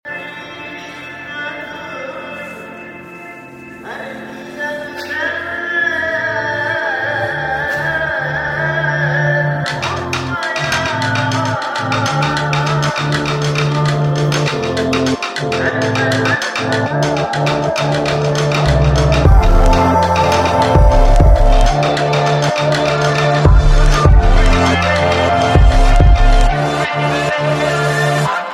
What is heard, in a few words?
Koto